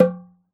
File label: oxaca, percussion; ILIO